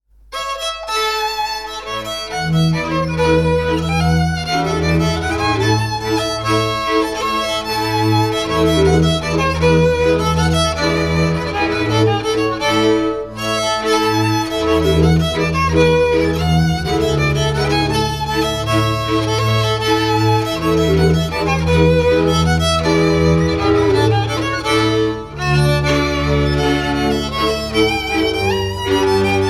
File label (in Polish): Krakowiak